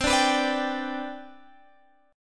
C Major 7th Suspended 2nd C down Guitar Open G, chord